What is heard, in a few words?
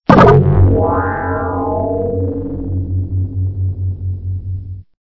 Stinger; STINGERS